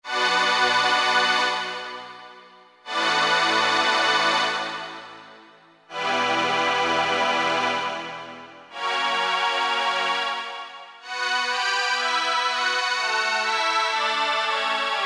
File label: VOICES; Akai SG01v